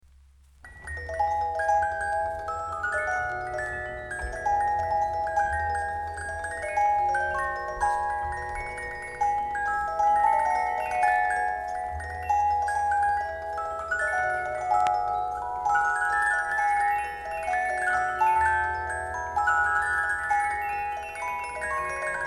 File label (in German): Musikdose mit Walze